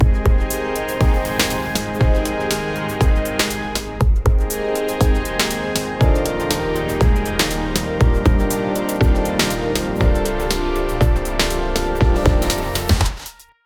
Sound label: epic soundtrack to anime; anime opening